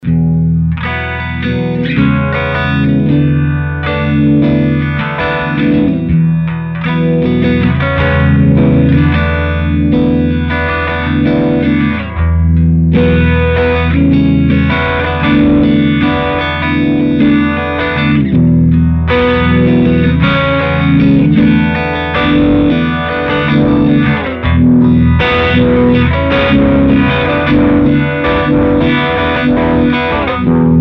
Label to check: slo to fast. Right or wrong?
Right